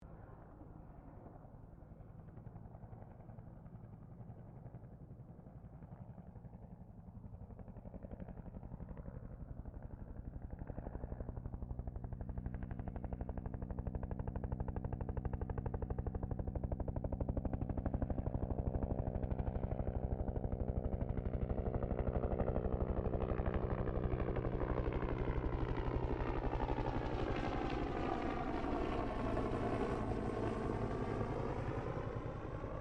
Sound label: HELICOPTER 1967 BELL UH1 IROQUIOS PASS DISTANT; RAN UH-1 Iroguios; Helicopters (Sound Effects:Vehicles)